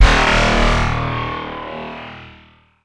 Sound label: level up